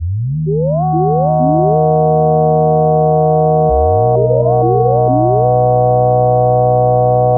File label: pad metal ghost pad; fabrik core